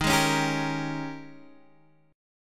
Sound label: Ab Major 7th Suspended 2nd Suspended 4th Eb